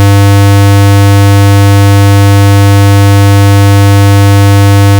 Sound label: sqr 100Hz -1dBFS; 5s